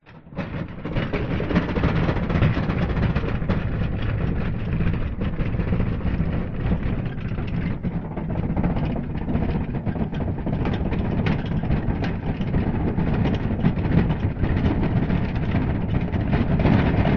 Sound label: tank tracks fast over rough ground designed vintage